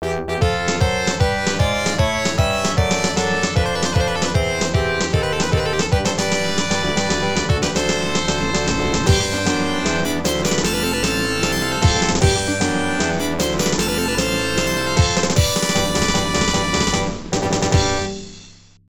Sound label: Mission Complete; Star Luster; X68000